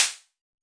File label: noise snare